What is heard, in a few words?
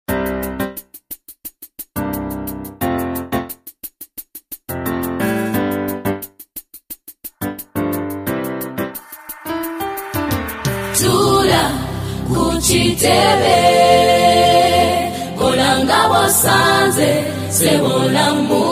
Stage Performance By Choir, gospel; Kennedy Secondary School; zambian gospel music